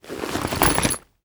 item bag pickup

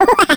skelly small

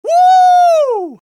scout triple jump; vo

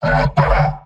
m positive vocalization; pyro; tf2; garrysmod; mvm

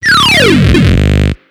death; Garry's Mod; tf2 content

